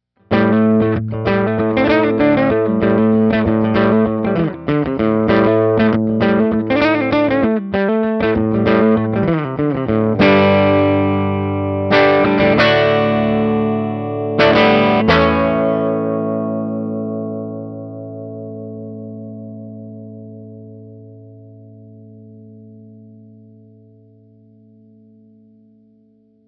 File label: 6l6 clean